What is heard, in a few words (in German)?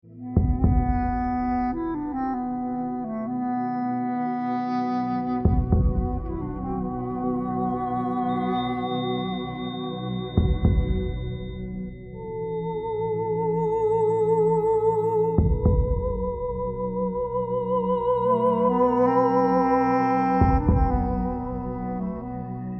klangpyramide